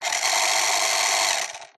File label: motor small coarse cough to life toy car rc; Skyclad Sound